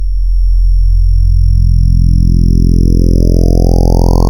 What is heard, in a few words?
Retro Sweep Saw; Ableton Live 12 Lite; Vector; Sprites